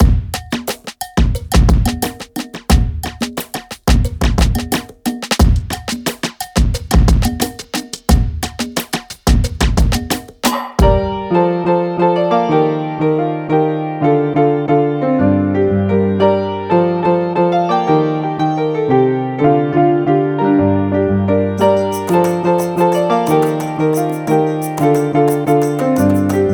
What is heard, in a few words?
Instrumental